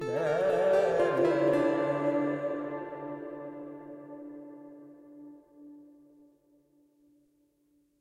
Amb Stinger Restart; assassin s creed chronicles india; Katasraj

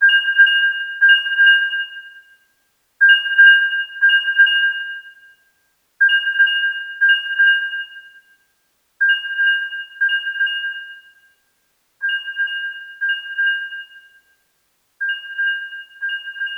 Multiplayer SFX - Matchmaking

